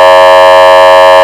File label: horn, sirens; code3 mastercomb